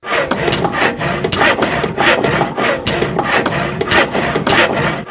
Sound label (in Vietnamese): Tiếng cửa gỗ